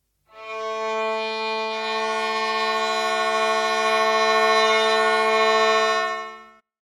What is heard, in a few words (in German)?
geige